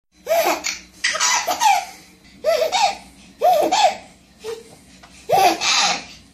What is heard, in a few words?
Example inhalation 4 months